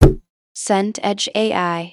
stomp heavy

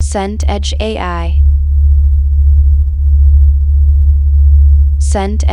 scifi engine room deep

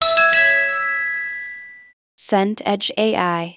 upload done

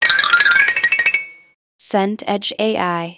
xylophone run

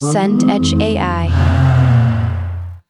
crowd boo

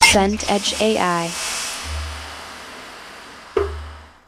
toilet flush

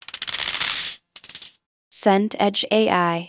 window blind venetian

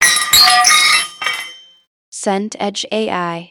drop metal

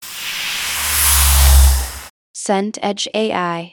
car pass by

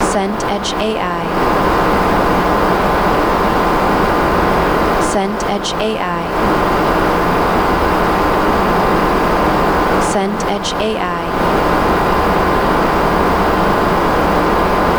room tone church

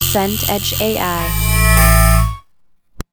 tram pass

